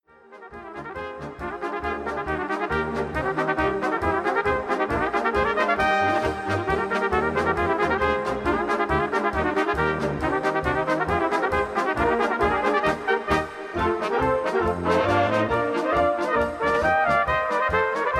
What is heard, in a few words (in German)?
Trompetenlaune